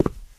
tile, player footsteps survivor walk

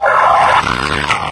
zombine die; npc; source engine; episodic

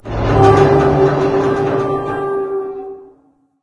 bin squeak med; video games; ambient; source engine; portal2; outland

